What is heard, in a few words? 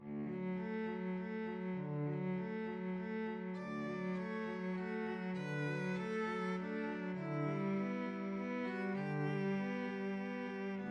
violin cello duet